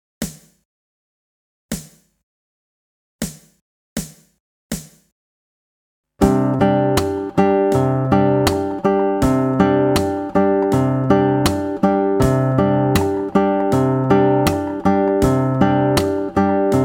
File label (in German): daumen slap auf 5 saite langsam